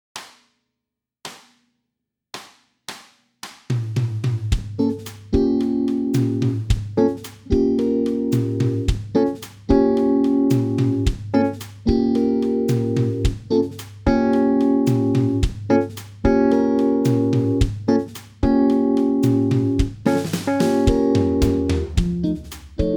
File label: playback basse